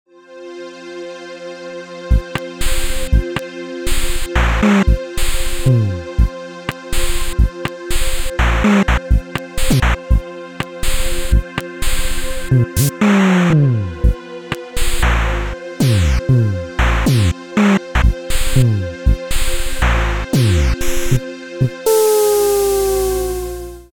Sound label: leldrums strange